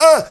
MALE SOLO, MALE NOISE, Heart of Africa

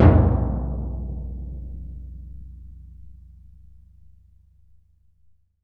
TMP C#, Synclavier Percussion; ILIO; Synclavier Percussion 2; World & Orchestral

